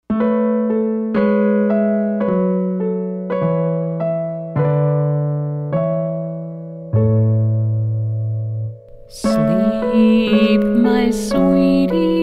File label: Softly Slumber Welsh Lullaby